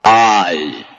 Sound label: aaaaaaiiiii